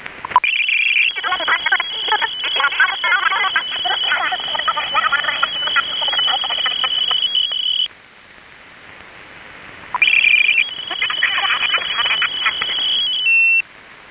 tadiran; vx cry

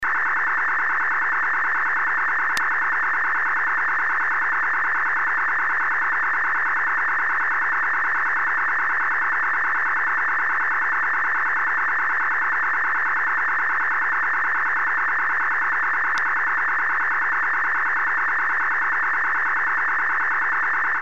clicks; sdr

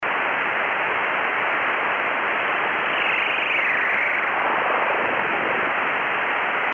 interference

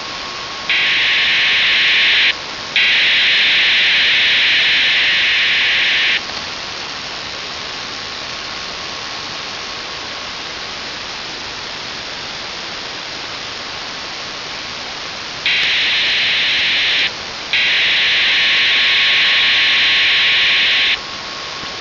16.858 mhz bw 6 khz 2400 bd

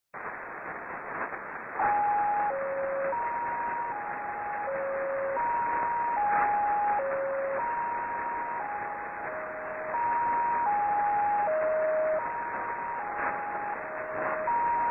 2820 USB

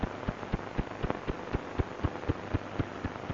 signal var AM

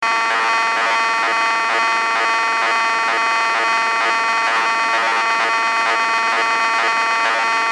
D-AMPS

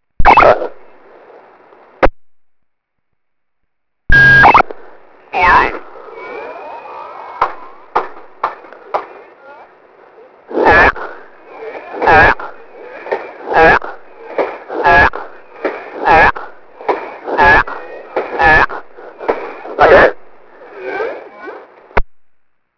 start-beep

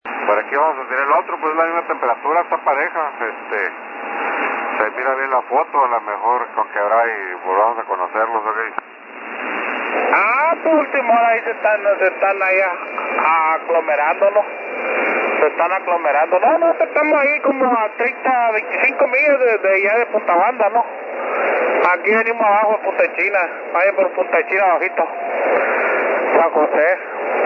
4295 kHz spain

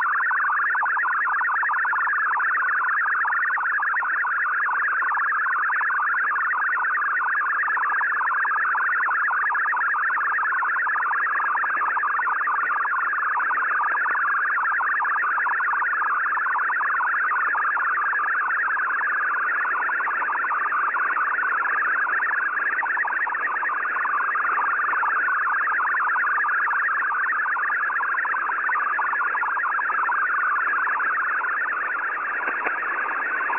mfsk; websdr recording

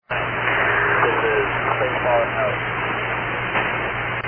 speedboat

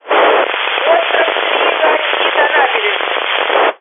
NFM

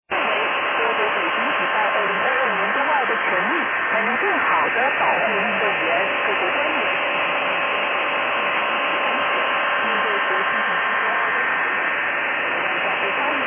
jumming usb

